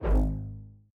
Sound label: throw ball wave; CrossCode; battle